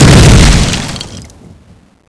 zombie stamper iron maiden explosion; nst zombie

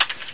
amg foley

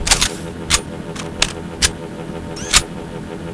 stun rifle reload, weapons

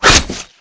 knife hit, weapons

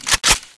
mg36 bolt full

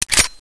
smg clip in

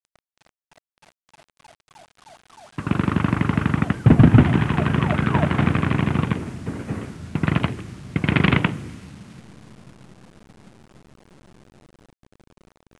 bazooka ambient

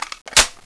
m16a4 clip in

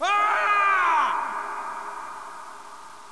scream

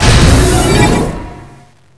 levelup; nst zombie

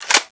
clip in

